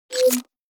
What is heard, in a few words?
disconnect